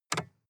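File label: sports car interior lightswitch double short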